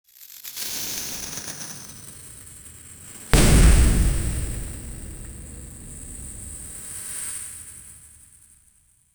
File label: for game sound effects pi, telekinesis